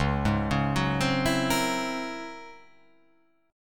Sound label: C sharp Minor Major 13th; arpeggiated; 9,11,11,11,12,11,12,x